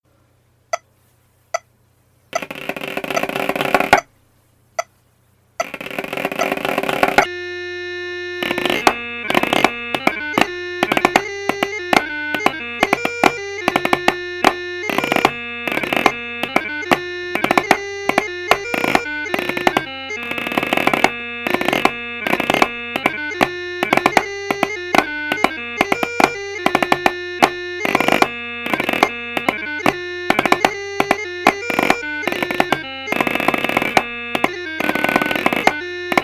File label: Snare Chanter